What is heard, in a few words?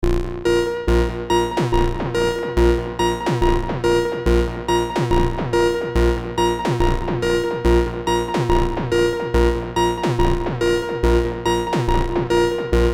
T REX Replicator Module Moog short long Delay Filter